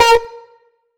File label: acid synth (Bb); Sonic Foundry (Sony Creative Software); Ugly Remnants VOL-2